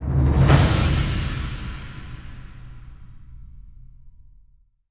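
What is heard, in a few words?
Fawn level Up; Blackfaun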